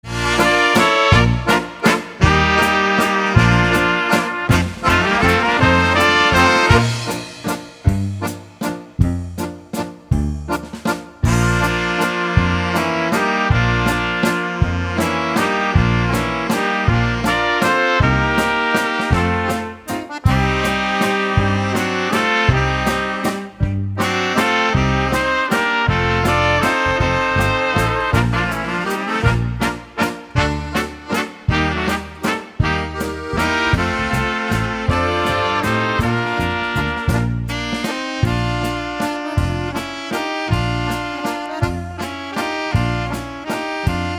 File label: Ringing Bells Waltz